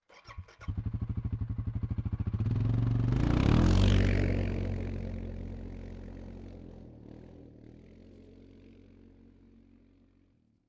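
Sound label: Suzuki 750 AXi exterior slow various; ortf; mkh8040; Sonniss GDC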